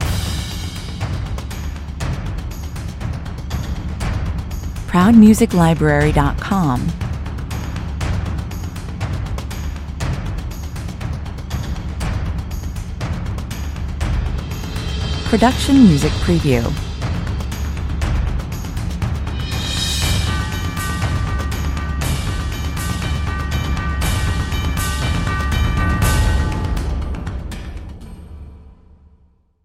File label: percussion mix